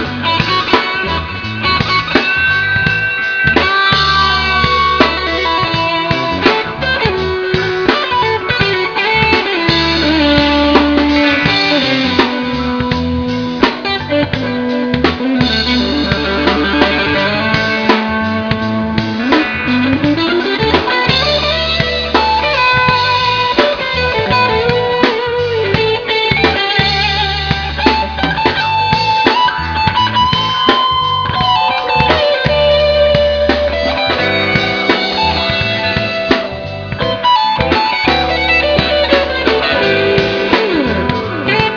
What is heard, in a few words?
guit